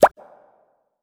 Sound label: Bubble Pop